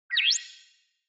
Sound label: Cyber